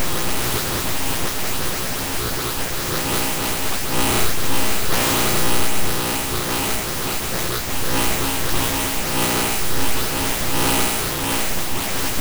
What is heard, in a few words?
white noise